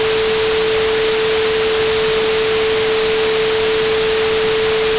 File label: 440Hz 2bit Dither